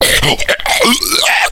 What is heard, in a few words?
death, slender shiver